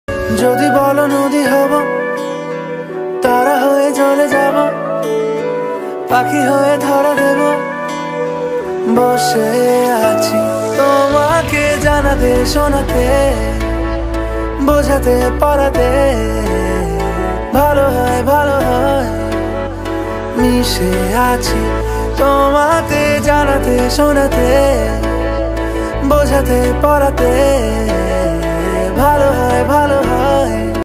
bengali ringtone

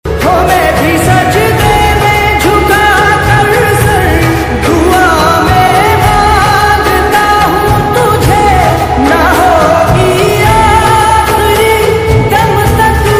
lofi hindi ringtone

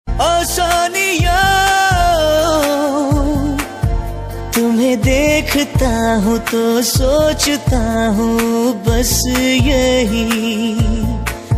hindi ringtone

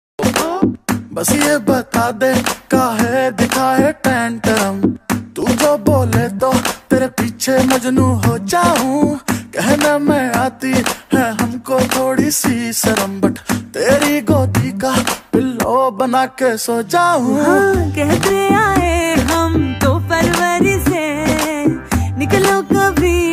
rap ringtone

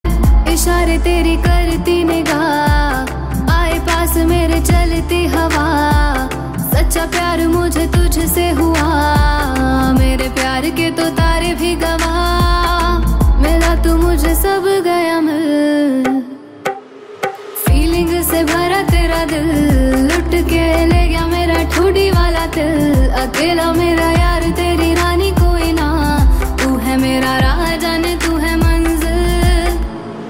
Female version Ringtone

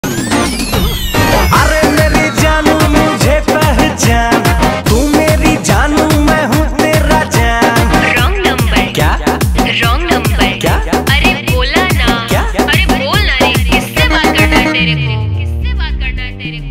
bhojpuri ringtone

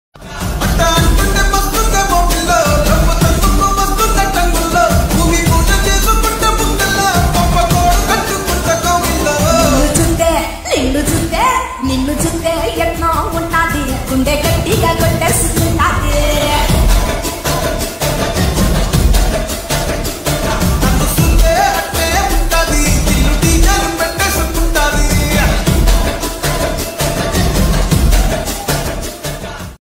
telugu ringtone

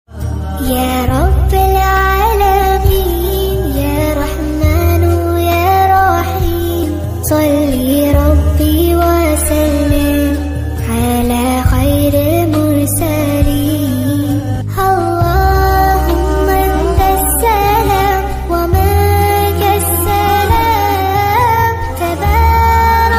arabic ringtone

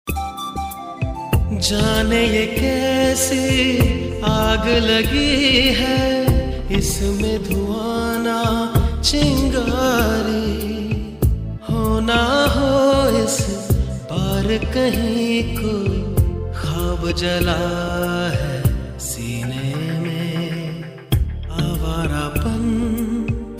hindi ringtone

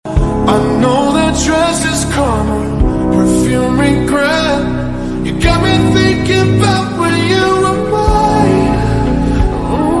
lofi remix english ringtone

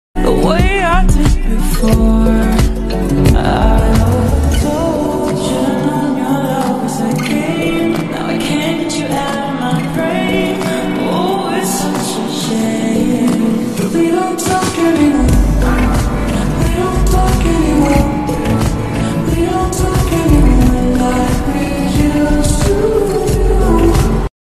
lofi ringtone; english

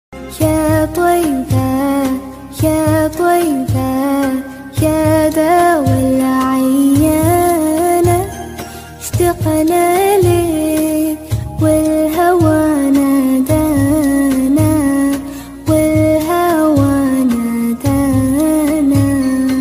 Arabic naat ringtone, islamic ringtone

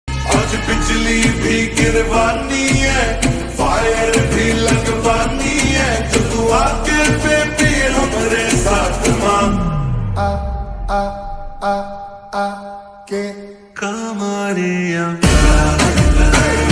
slowed reverb hindi ringtone

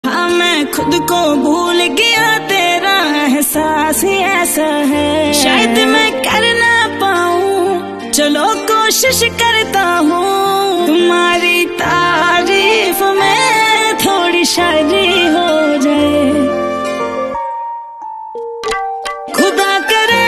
female punjabi ringtone

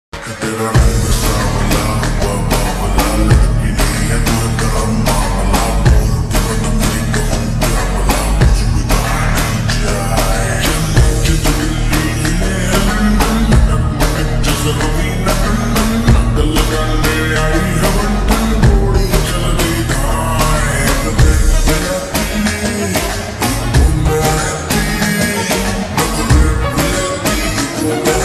slowed reverb ringtone

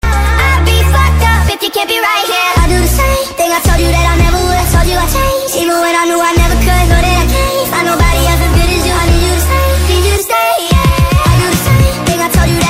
speedup english ringtone

